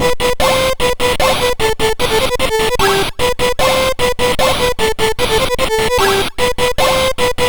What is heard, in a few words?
Intro Tune, sid MOS6581R3